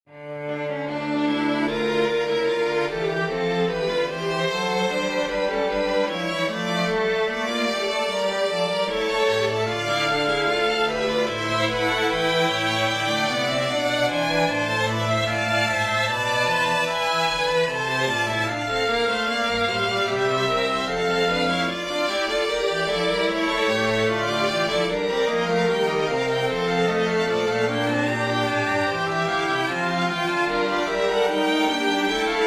for horn strings; bach johann sebastian; aria bisher habt ihr nichts gebeten meinem namen

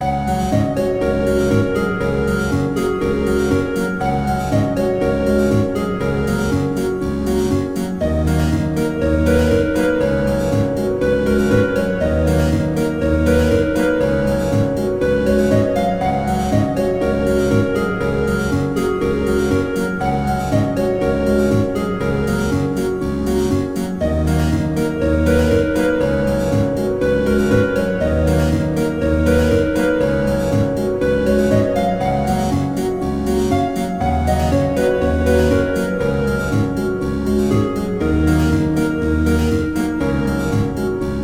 for harp and harpsichord; Sargent, Jonathan; the tramp

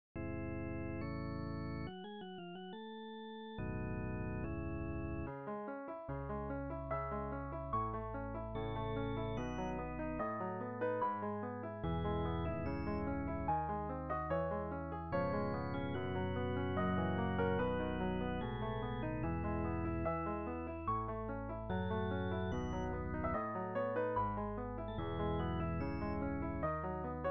for piano organ; Schumann, Robert; transcribed

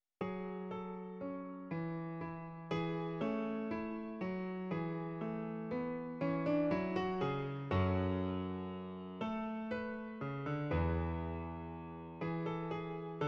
piano solo

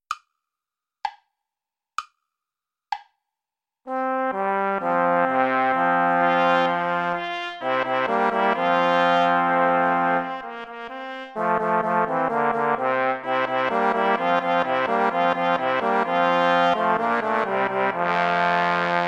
play along without trumpet; praetorius michael; psallite unigenito

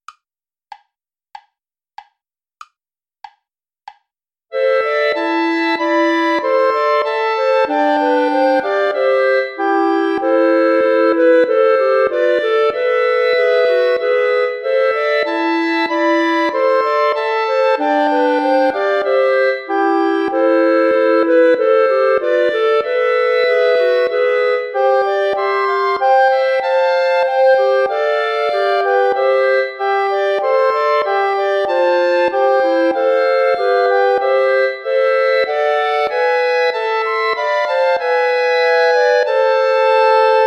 play along without bass clarinet; bach johann sebastian; beside thy cradle here stand

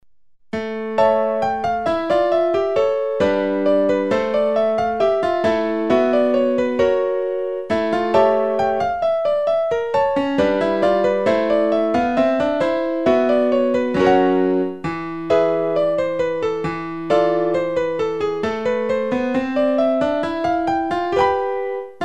guitar; diferencia

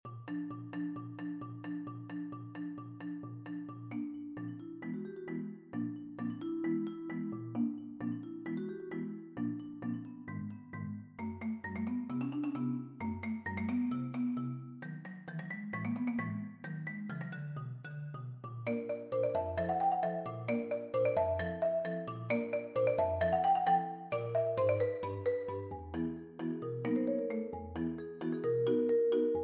piano suite arranged for vibraphone and marimba

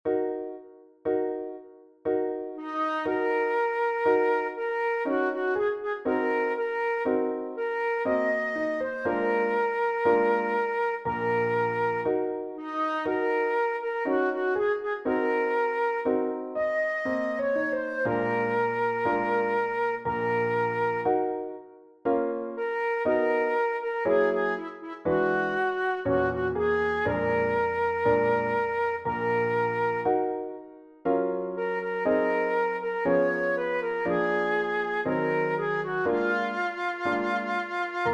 for flute piano

